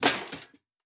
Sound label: Armour UI; Fantasy Sounds Bundle